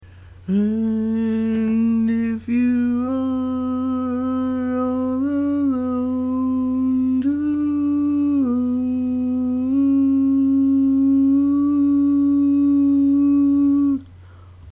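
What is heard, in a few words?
Baritone, Barbershop Tags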